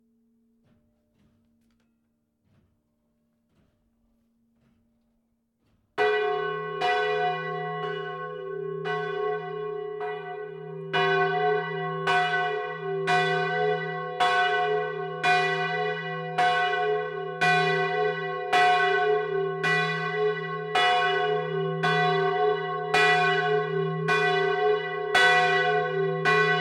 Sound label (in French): cloche; madeleine